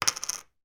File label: food chocolate coin in foil wrapper drop on table